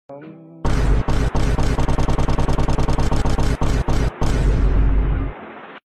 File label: boom loop